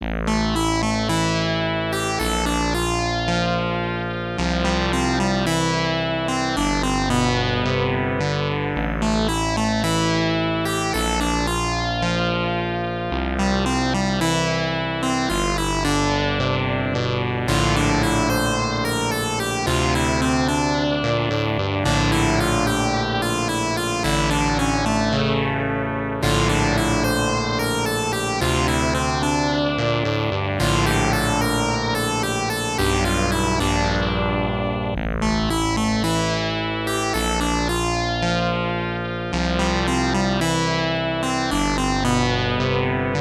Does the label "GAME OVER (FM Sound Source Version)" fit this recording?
Yes